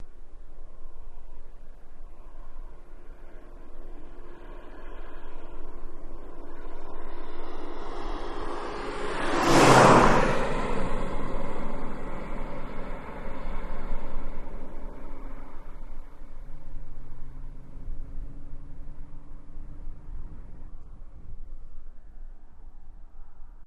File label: Mustang Medium Fast By, Highway, Smooth, Steady